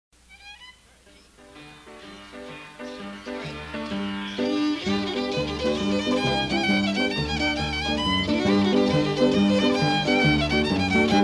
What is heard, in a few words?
quadrille; Pacific quadrille